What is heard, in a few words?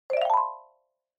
Xylophone Fast Short Up